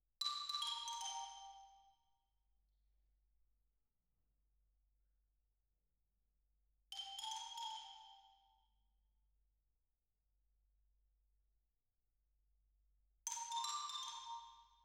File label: Twin R Xylo